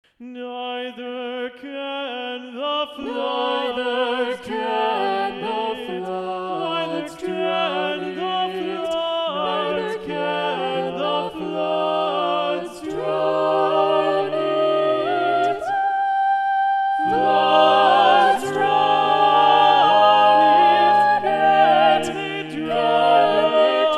SATB Soprano 2 Muted

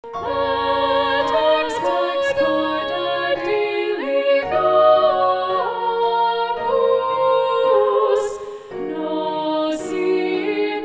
Ubi Caritas SSAA, Balanced Voices

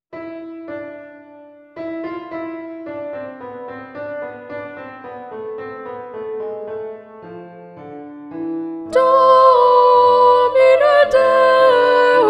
Domine Deus SA, Alto Predominant; Mass in G; J.S. Bach; honor choirs

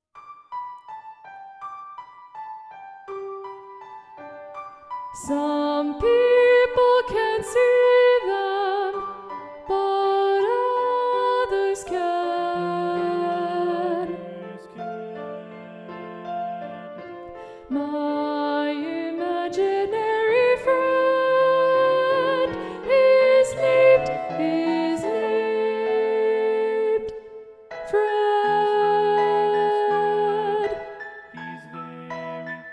SATB Alto 2 Predominant

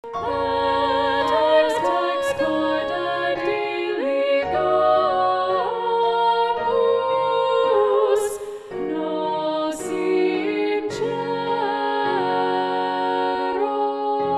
Ubi Caritas SSAA Soprano 1a Muted

